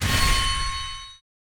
Ui Unlock

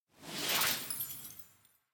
Drinking Fountain; gamerip

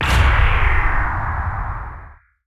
Large Sustained Beam; battle suit aces

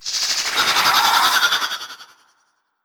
Naga Scream

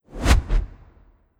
Night Wind R O C K; Gargoyle

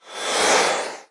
Partymode Crowd

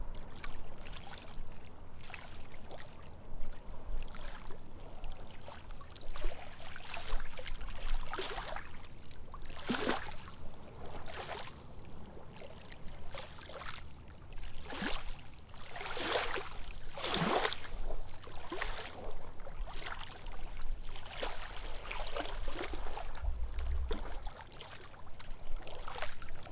Lake Waves Lapping Shore; Frogsong

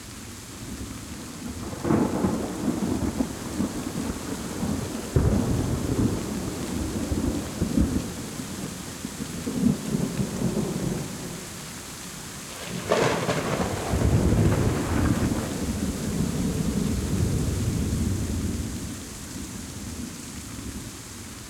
Rain Loop

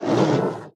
Attack

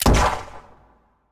Pirate Revolver

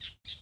Amb Hong-Kong Bird

